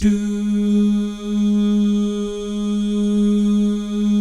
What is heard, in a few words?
"doo" guys, Vocal Jazz Fx